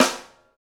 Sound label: St Snare, Roland BK-5; Snares